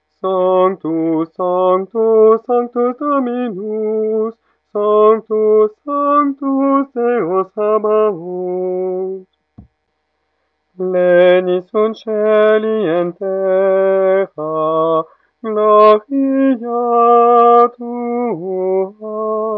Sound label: Sanctus tenor; mariage